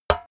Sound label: paint metal tin hit bright